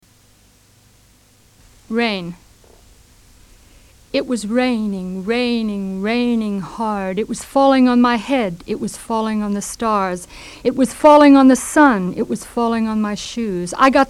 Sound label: rain